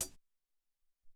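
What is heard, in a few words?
Lo Fi Hi Hat Closed, Alesis SR-18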